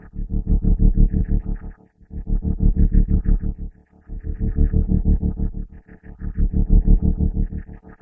Nano Blade Loop

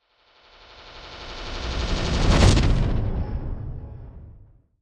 Cinematic Woosh

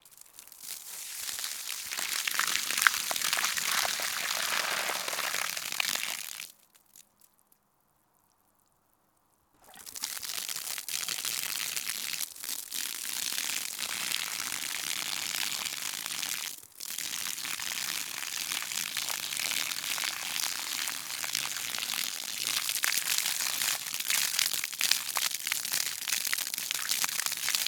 Water Tip Grass

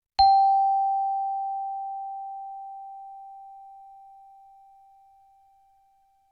Chimebar G Low

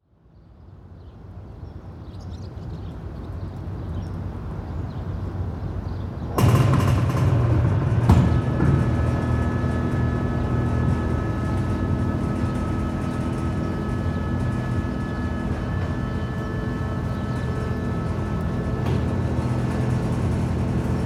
Heavy Industrial Door Opens

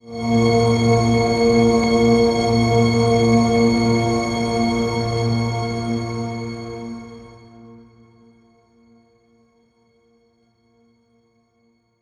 Disturbing Background

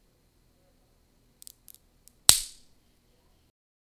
Breaking A Pencil

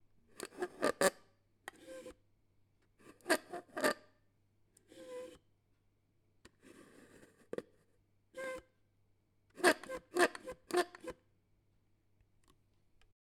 Straw Squeak

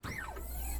Robot Moves His Arm

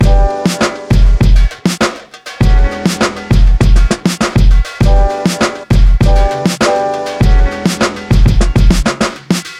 Funk Loop

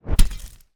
Whoosh Sword Hit Flesh

